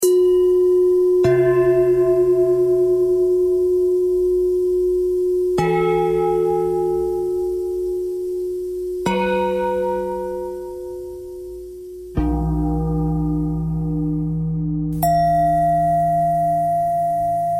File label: bell bend threads; bells